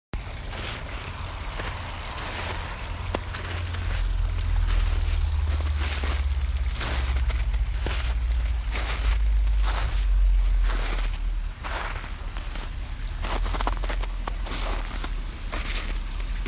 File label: snow and stream